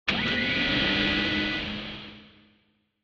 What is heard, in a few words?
Retro Anime Mech Equip Short